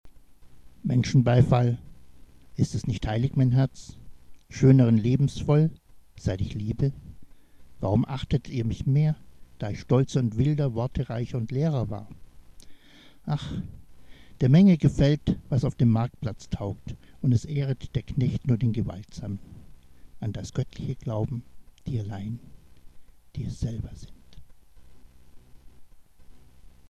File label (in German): menschenbeifall; hoelderlin